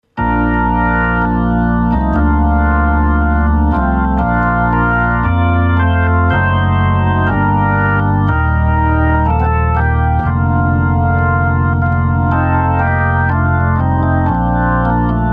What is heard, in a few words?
3300w Hymn, hammond organ